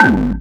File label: Hard Kick